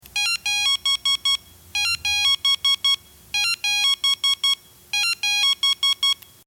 Pieper

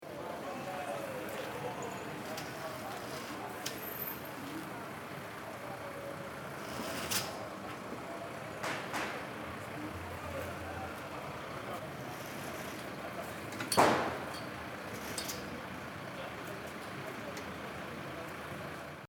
Silvester Feuerwehr

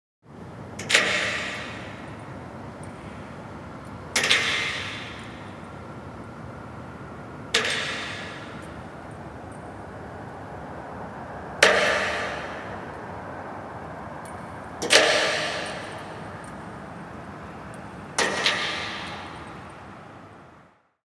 Landschaft Kette schlaegt

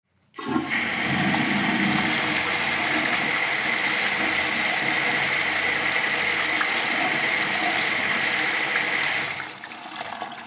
WC Spuelung

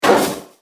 schuss

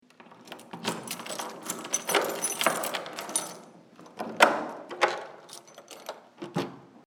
Tuer oeffnen

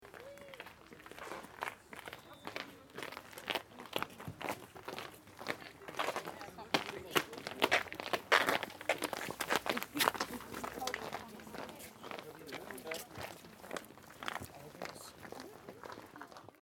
Fussball Schritte

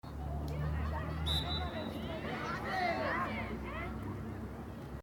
Fussball Jungendtraining Pfiff

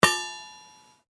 Metall

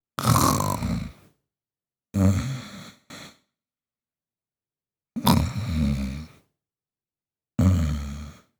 schnarchen kurz

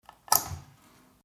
Stecker raus

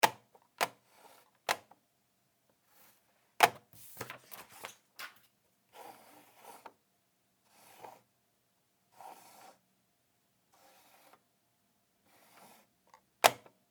Maus in arbeit

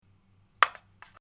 Schalter

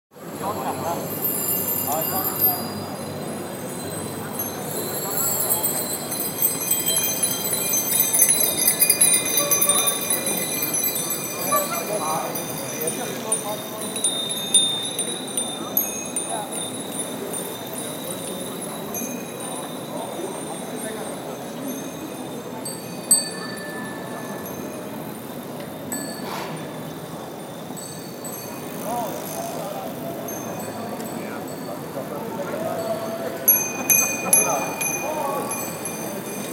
fahrraeder

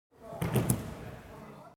Judo Wuerfe

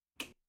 Im Badezimmer Licht Im Badezimmer Ausschalten

